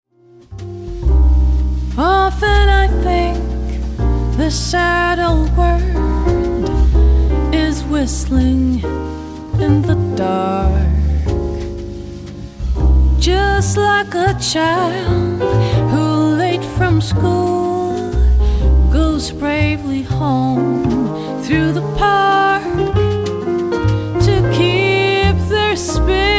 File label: whistling